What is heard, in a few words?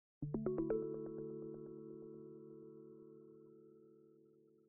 ring